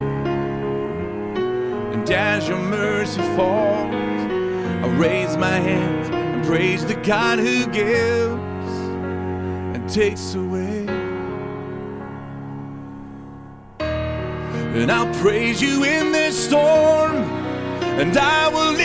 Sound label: acoustic